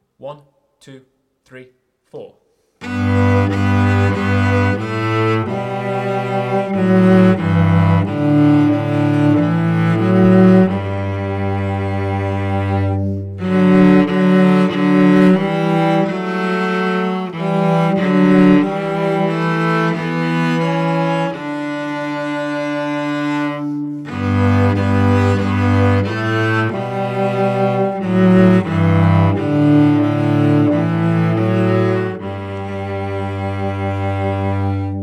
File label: cello